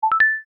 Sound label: Redial completed; Nokia N79; Audio themes